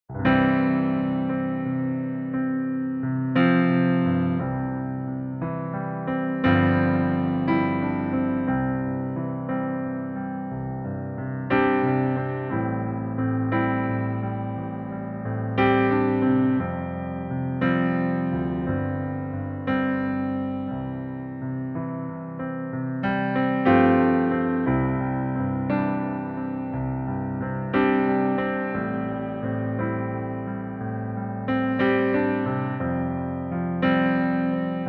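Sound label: piano playback C major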